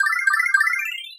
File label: Starman Running Out; Wii